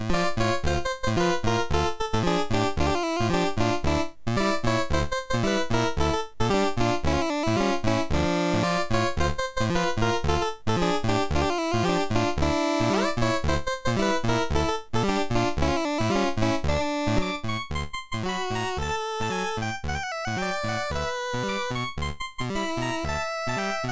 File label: Habanera, MOS6581R3